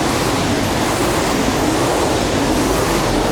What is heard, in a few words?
Tornado Loop; Destruction